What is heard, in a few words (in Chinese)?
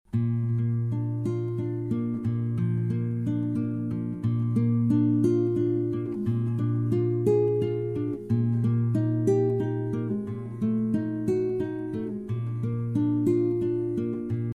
背景音乐